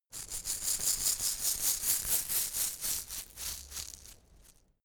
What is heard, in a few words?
insect shaker rattle dynamic movement